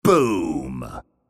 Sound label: Boom; HR; Clicktacular